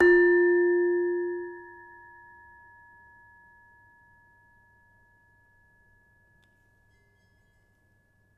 Demung, gamelan Samples; gravityharps; timbrebox

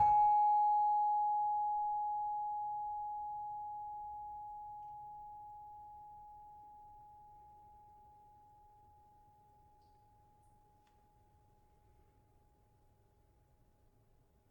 Saron 5 G Sharp, gravity harps tuned

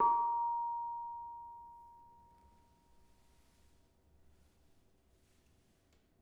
Bonang Barung, gamelan; gravityharps; timbrebox

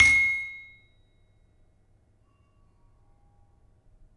Peking hard, gamelan

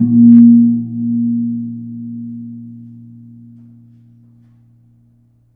Kempul Pl 6 hard, gamelan; gravityharps; timbrebox

